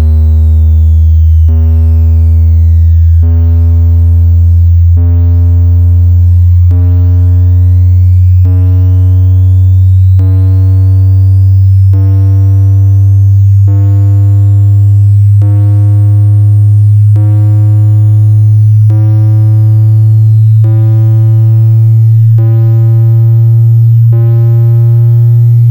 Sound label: triangle 2 sine